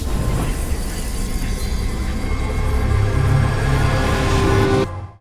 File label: near win riser; netent